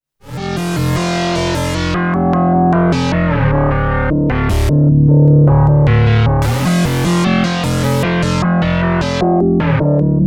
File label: OBXa